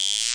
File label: Wersimat 24, drum machines; wersira2